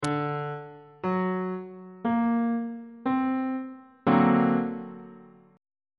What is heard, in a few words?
c double sharp augmented 7th chord on bass clef